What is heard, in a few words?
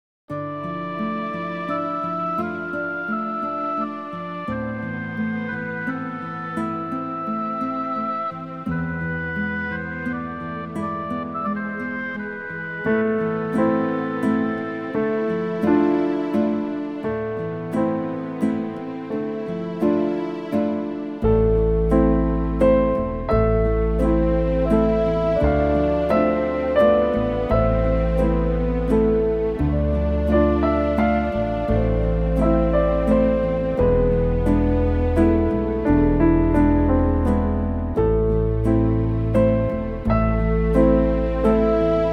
Sad Lament SLOW